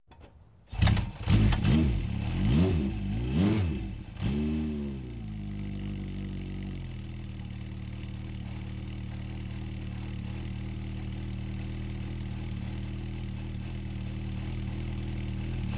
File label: motorcycle